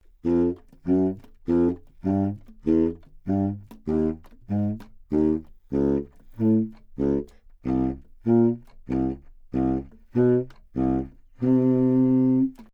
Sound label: contrabassoon F2 phrase mezzo forte nonlegato; solo instruments